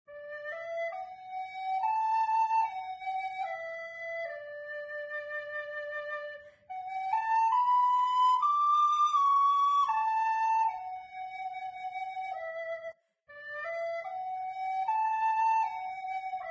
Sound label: Flute; Zomboid; Lifestyle